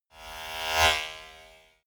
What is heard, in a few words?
servo small whoosh